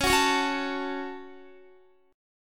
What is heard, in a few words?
C sharp Suspended 4th Sharp 5th C sharp down Guitar; strummed